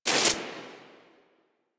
Screen Glitch

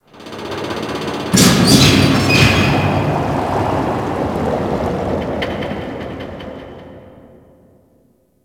Ocean Metal Bubbles; Ambience; Warframe; Grineer Ocean

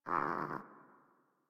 Catbrow Kitten Snore; Warframe

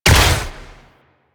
Gas Arrow Explosion Hit; Warframe; Infested; Derelict Cernos Prime

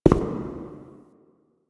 Shadow Figure Steps

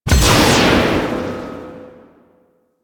Spear Throw Impact; BE Ferrox; Spear Gun

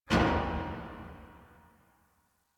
Parking Lot Metal Door Distant; Warframe; Ambience; Nineteen Ninety Nine

